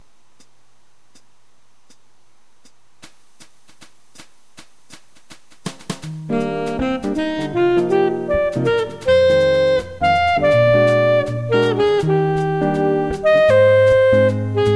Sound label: sax